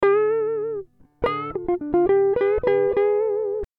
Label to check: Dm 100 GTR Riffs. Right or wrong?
Right